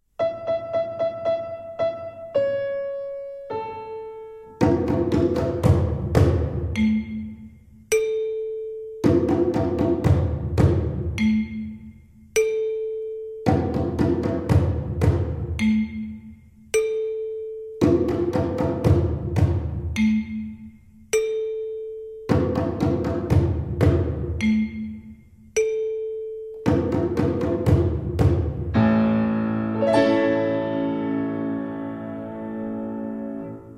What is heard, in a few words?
The First Twinkle Rhythm